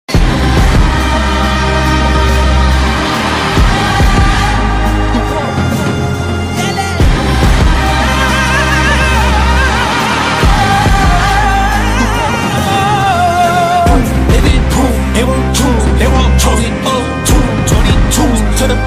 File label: BGM Ringtone